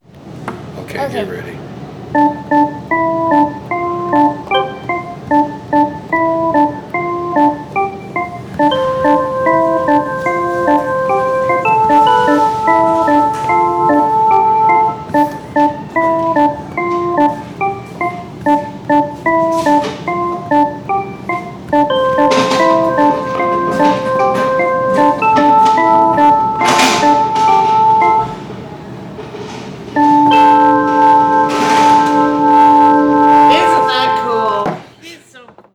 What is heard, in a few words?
BEEP